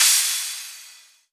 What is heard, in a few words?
Crash, Cymbals